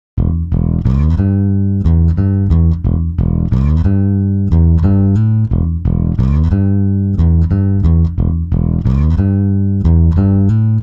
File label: bass, time stretch; roni amazing sd type iv